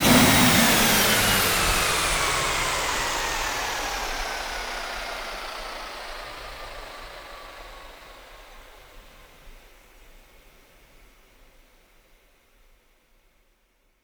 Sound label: Aircrafts ASU End; DCS